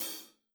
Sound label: Hihat Session Dry Stick Tip Soft; Novation LaunchPad Mini MK2; Ableton Live - RGB Demo